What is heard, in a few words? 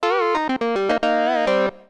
duophonic